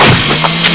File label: chainsaw hit